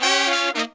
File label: Pop & Funk Brass